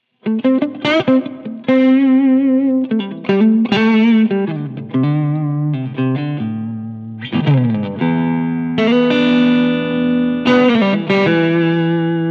US Warm But Clear; Torpedo Captor X SE; Pete Thorn

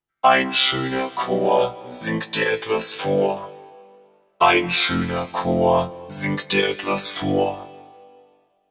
VP550 Vocoder

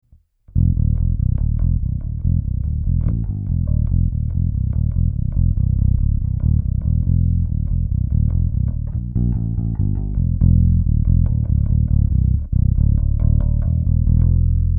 Jbass V Dlx ELow B String Line Speaker

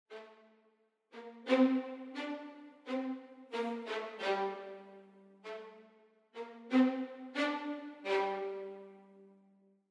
Violins Spiccato Slow; Audio Imperia Areia

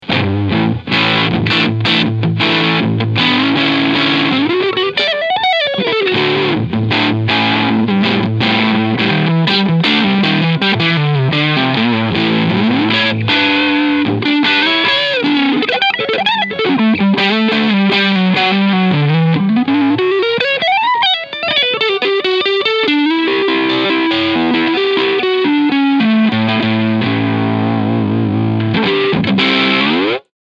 Booster; JHS Space Commander

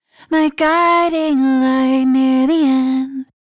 Vocals FILTER MS 20 Classic Parallel Distortion

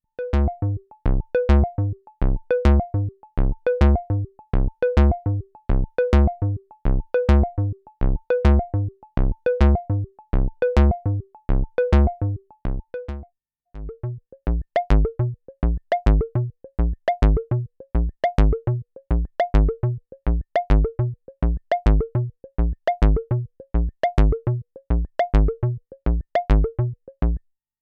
DFAM vs Edge Sequence

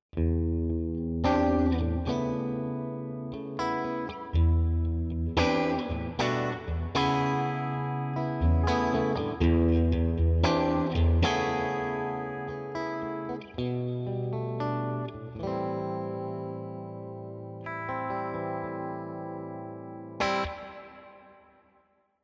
Dynamic Hall

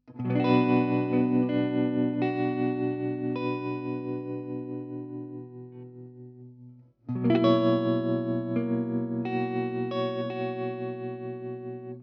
Strat; Presonus 64S

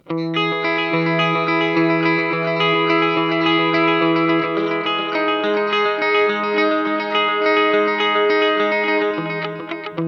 Boss SDE-3000D low cut

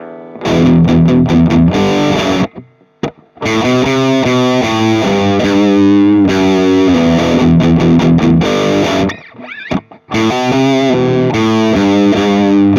Antelope Discrete 4 Amp Sim; England

